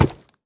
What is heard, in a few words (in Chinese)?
猪灵脚步声